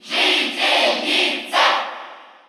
Cheer Russian; Villager Female; SSBU

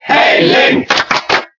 Cheer German; Link; SSBB

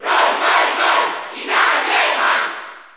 Cheer Spanish; Roy Koopa; SSBU

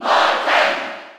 Cheer German; Morton; SSB4

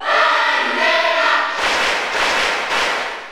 Cheer Spanish PAL; Palutena; SSBU

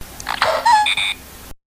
Wiimote Sound; Olimar

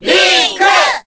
Cheer Korean; Link; SSBB

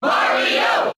Cheer English; Mario; SSBB

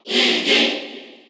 Cheer Korean; SSBU

